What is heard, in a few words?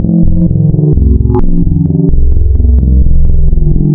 Spectral, wavetables; Architecture 32